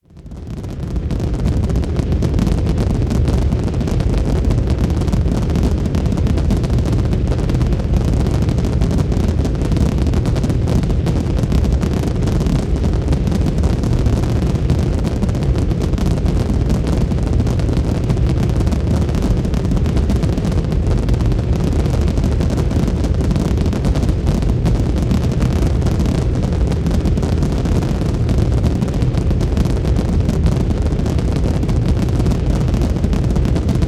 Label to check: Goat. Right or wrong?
Wrong